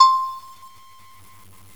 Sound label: Agogo; GMPlay; Percussive; Amiga 8-bit Sampled Voice